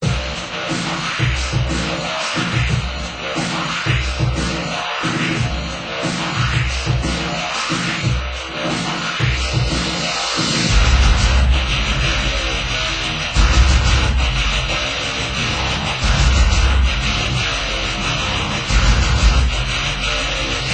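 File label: Music Ambient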